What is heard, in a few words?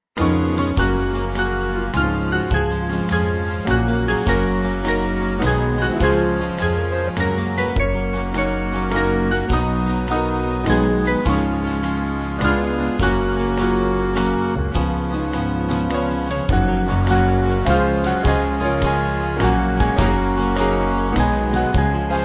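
Band, Music Backing Files for Churches; Praise The Saviour; Acclaim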